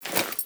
Foley Action Default Melee Swipe; Characters; Player

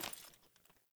Foley Movement Armour; Characters; Enemy; Melee Grunt; Sinecure

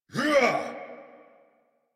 Exert; en; Warframe; New War; Kahl

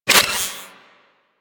Battery Charge; Warframe; Cowgirl

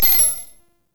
Flying Robot Beep; Quests; Veilbreaker; Ambience; Kahl Quest

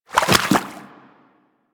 Infested Small Walk Water, Footsteps; Warframe

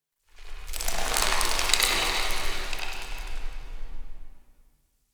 Infested Dust Fall; Warframe; Corpus Infested

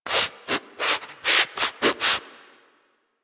Armadillo Vocal Sniff Multiple; Characters; Warframe; Hunting Critters

